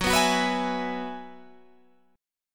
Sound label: F sharp 7th Suspended 2nd Suspended 4th, Guitar, Standard; strummed; capo 9; chordshape; 14,14,16,16,17,16